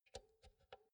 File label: insert cinch quick